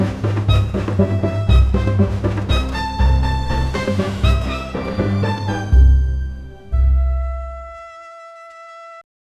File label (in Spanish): el flautista debe crear, carnival